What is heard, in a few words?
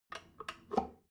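drain valve close heavy, bathroom; haus garten; badezimmer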